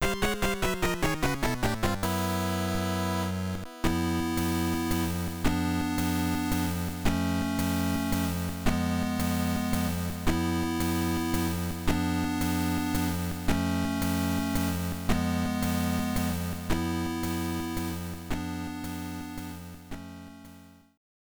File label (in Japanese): ゲームオーバー; Medarot